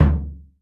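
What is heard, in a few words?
bass drum MED, 50S JAZZ; Northstar - New Gold VOL-1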